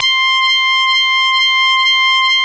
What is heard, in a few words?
SQUEEK, LEADS; Masterbits; Vintage Voltage